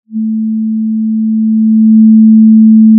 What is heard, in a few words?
pop, Sound of a cruise ship horn